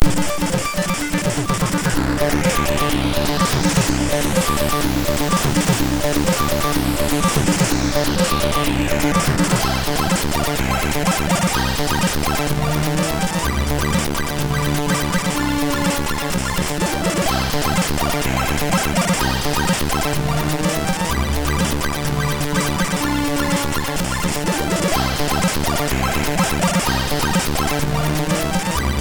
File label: Sound Demo, sid MOS6581R2